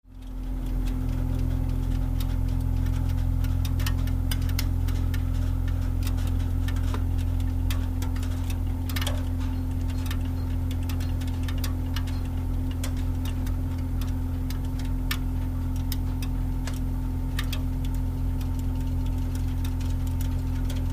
Hot Water Heater Pip

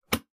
light switch, Light switch being tu; switches & levers

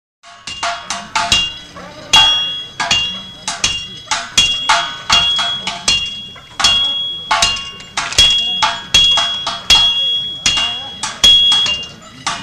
Anvils Tin Anvils Tin

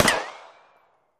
RICOCHET; riccos

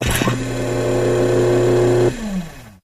Hydraulic Pump Dent, seats & stools

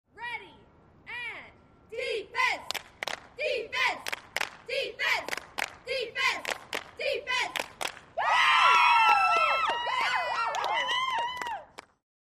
Cheerleaders Cheer

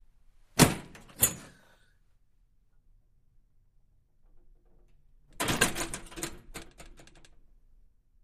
Door Push Bar Wood

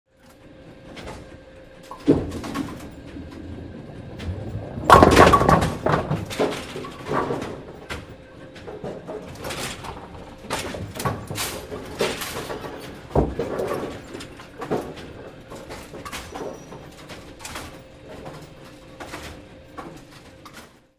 Automatic Bowling Pi, bowling

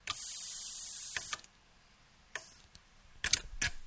Robothead Mechanism Sounds; gears

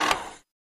8mm Projector Click; projectors; movie & projectors

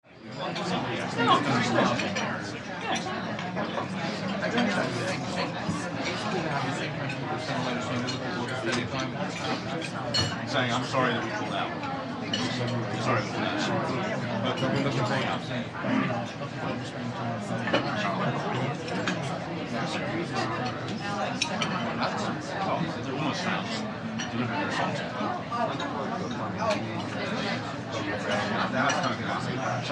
Busy Large Restnt

